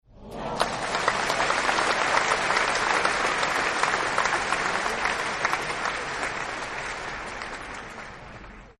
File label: Applause Ahh Light; Applause Ah Oh; Human; Partners In Rhyme